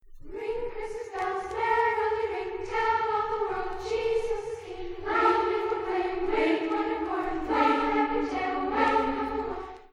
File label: Ring Christmas Bells, Ukranian Carol; Christmas in Cleveland; Holy Name